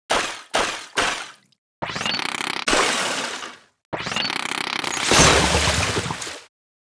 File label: SFX - Splatana Stamper; Splatoon 3; Splatune 3